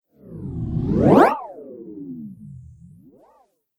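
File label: Crazy Motion Elastic Whoosh; Crazy Motion - Eleastic Whoosh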